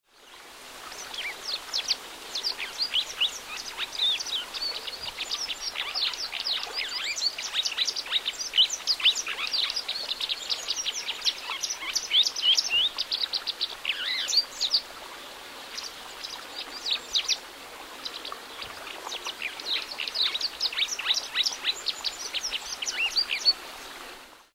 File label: white wagtail